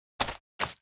Spider Walk

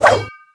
harpy Atk; Seer; GamigoNA

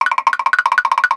King Crab Action; Seer; GamigoNA

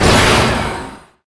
Magic Blade; Qickskil

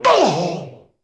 Core die

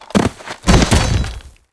Torturer die

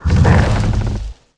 Jewel Tree Dmg

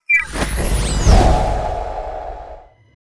Entrap; Seer; GamigoNA

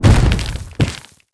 Weakened Orge die

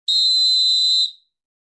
soccer whistle

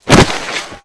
Fire Lich Die

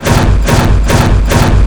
run

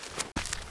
Cat Black Action; Seer; GamigoNA

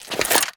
Ball Cannon; Seer; GamigoNA

